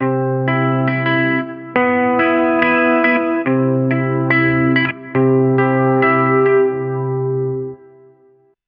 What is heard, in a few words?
midwest emo electric guit